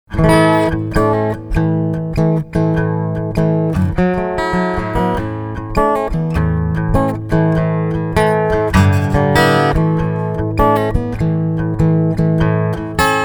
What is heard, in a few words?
Soft Blues